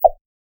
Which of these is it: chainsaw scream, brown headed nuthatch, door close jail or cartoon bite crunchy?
cartoon bite crunchy